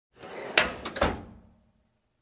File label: Open file cabinet drawer